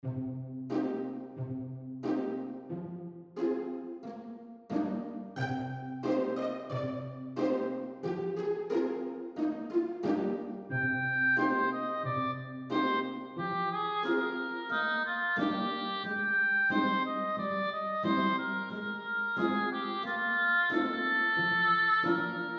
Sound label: orchestral; Abendspaziergang zur alten Mühle im Schnee – Orchesterfassung